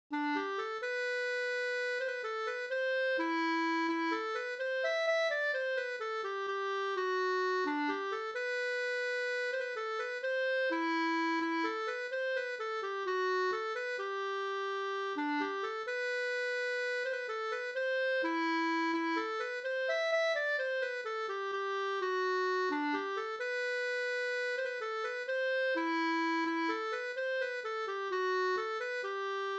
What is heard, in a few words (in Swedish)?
brudmarsch; fr Norn Sater